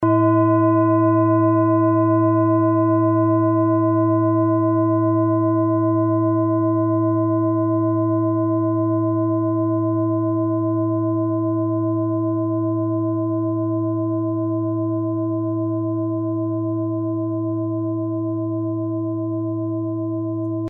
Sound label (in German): klangschale set; Klangschalen-Chakraset N°12 für alle Chakras; Gewicht = 2230g; Durchmesser = 27,0cm; Höhe = 11,6cm